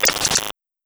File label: transition